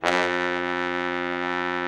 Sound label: Trombone; Sound & Vision - Gigapack II CD 1